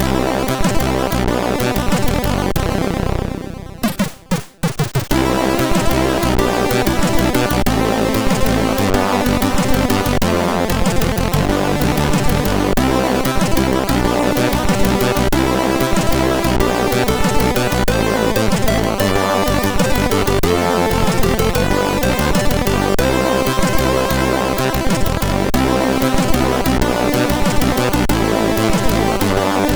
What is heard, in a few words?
Peaceful, MOS6581R3